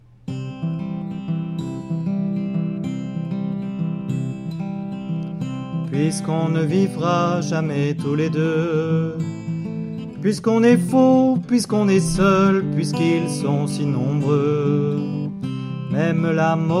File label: Soprano